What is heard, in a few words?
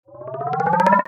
Bar chart expand animation infographics